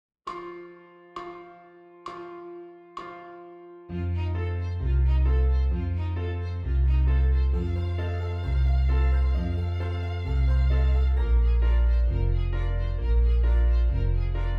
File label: Exercise Horns in Fifths